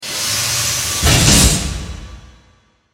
Machine Press, Machine, Press, Sequence, Single, Press; 5.1; BlastWave